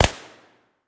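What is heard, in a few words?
smg stereo, fire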